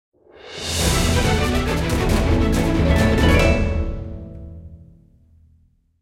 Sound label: Battle Elite Fight Intro BGM; AFK Journey; Android